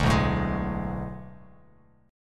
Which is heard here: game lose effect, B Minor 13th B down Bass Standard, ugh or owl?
B Minor 13th B down Bass Standard